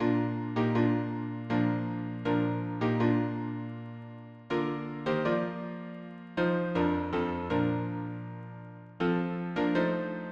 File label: harmony piano